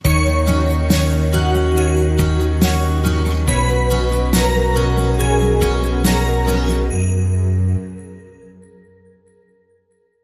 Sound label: Joyful Acoustic Christmas Sting